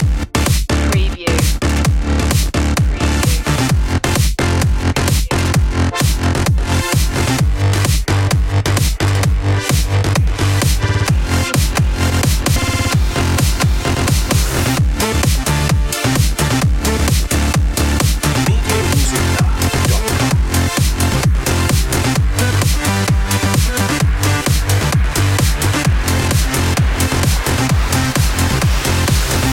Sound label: beat the grind loop